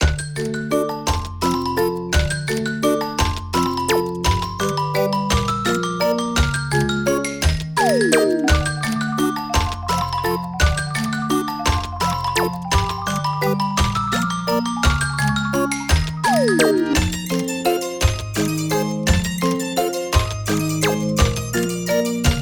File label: Toys Factory loop, BGM